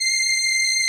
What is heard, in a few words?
SOLINA, String Machines